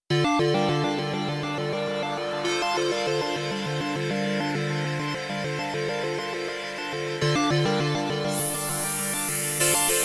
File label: Orbit Mood, Korg Radias-R